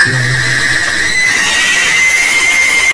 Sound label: jumpscare sound